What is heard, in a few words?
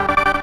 synth